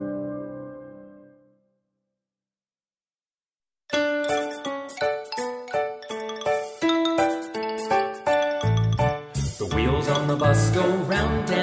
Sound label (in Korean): 영어남 동요; 남자